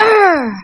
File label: pain